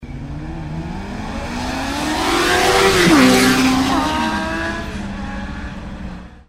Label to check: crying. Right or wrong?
Wrong